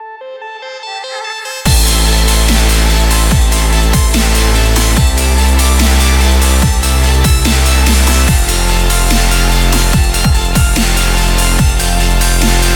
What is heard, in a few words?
aggressive hi tech and extreme sport dubstep